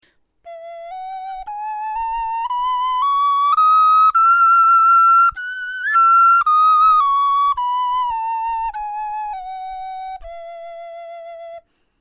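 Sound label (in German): tonleiter, Knochenflöten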